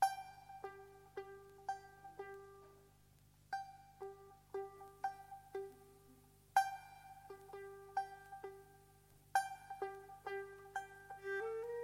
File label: Kamanche